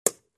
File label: Knocking on a motorcycle helmet